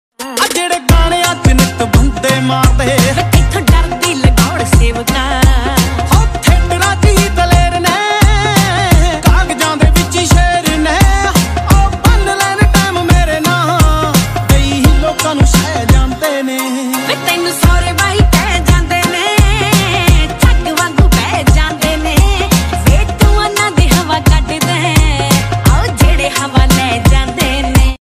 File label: Popular Punjabi Ringtone